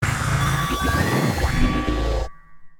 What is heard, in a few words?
Big Monster Special Attack